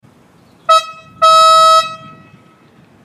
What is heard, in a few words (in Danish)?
sa tyfon